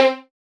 Noises Strings; Synthetic's Serum Collection; Production Master - Vital Future Bass 4

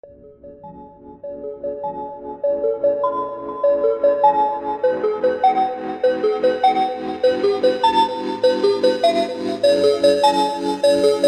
alarm, lolicore

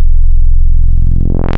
303 Acid

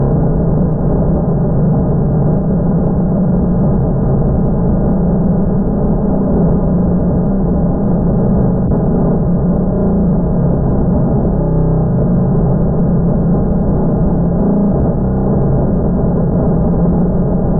Guitar Noise; These Go To Eleven; JK Guitar Noise; lolicore

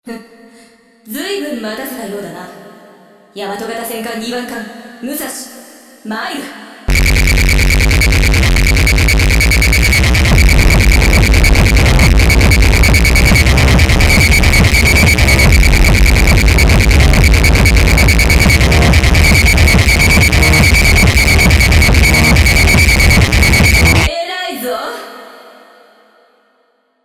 Noise

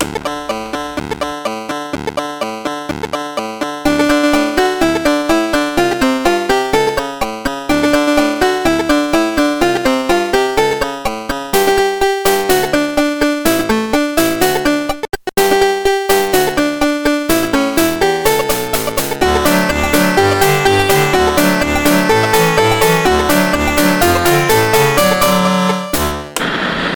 Casio VL-TONEs; lolicore

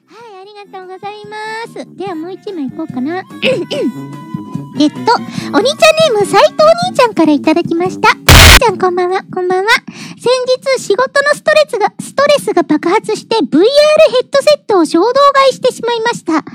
noise ensemble; lolicore

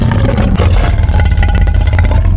Lion, Animals for Serum Wavetables; Synthetic's Serum Collection; Cymatics - Animals for Serum - Gold Edition Wavetables

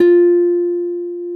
Synth Harp; ESW Sphere

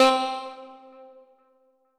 VOCAL Good Lead; Synthetic's Serum Collection

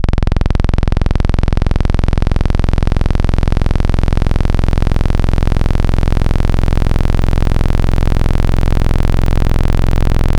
analog 303 saw; Synthetic's Serum Collection; ESW Core Wavetables; Echo Sound Works Core Tables

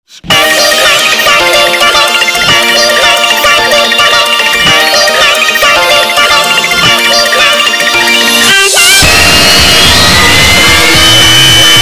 Noise Ensemble; lolicore

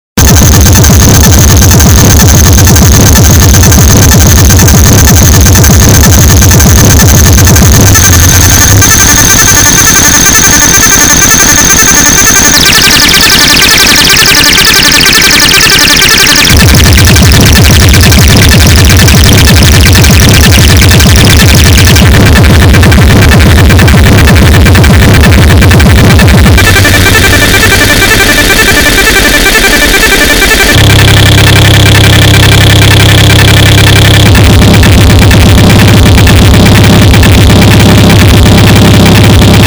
keyboard mash

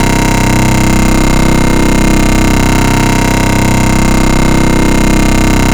Serrated, Noise Osc Samples for Serum; Cymatics

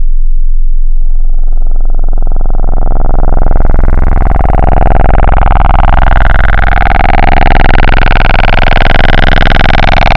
Sub PWM Reso Mod, Serum Wavetables; Synthetic's Serum Collection; Cymatics - Nuclear for Xfer Serum Wavetables

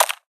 Crunchy, noises; Aubit; Gray Vol. 1 Serum Noises

